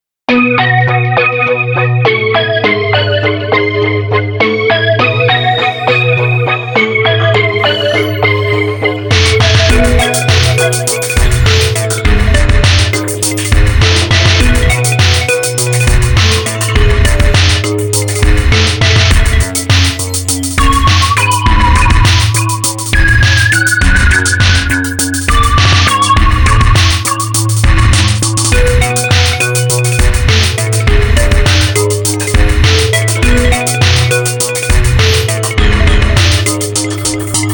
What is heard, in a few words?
beat test; weeklybeats-2016